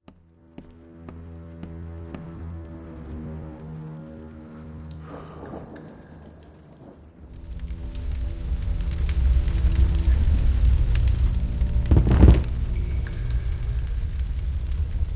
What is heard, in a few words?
Fire House; House of 1000 Doors Serpent Flame